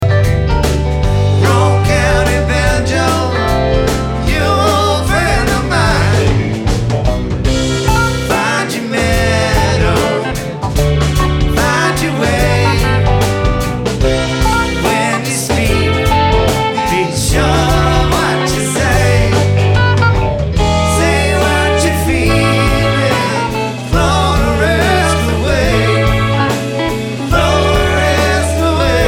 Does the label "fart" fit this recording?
No